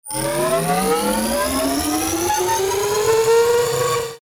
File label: Ascend Fuzz Power Up Hum; Production Element Imaging Element Accent Transition; Ascending